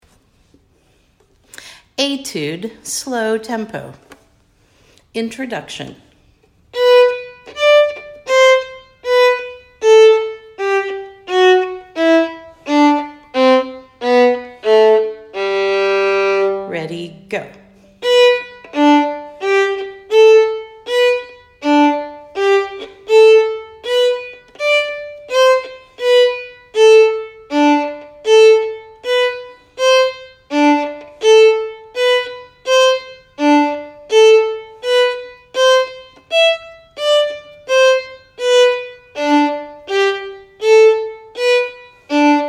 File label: Etude slow tempo